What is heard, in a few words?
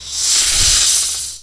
snake hiss